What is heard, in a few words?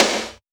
perfect steel snare drum sound c key; tuned drums c key